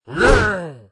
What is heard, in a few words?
giant gob swing